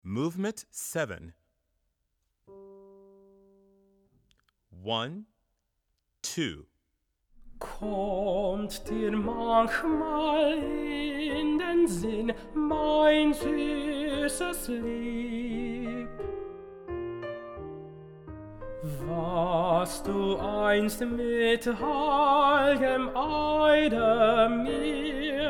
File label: Tenor; Ziegeunerlieder; 7th Movement